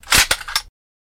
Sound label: Uzi Metal Mvmt Cocks